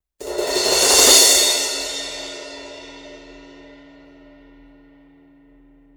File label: med thin crash, cymbal; sabian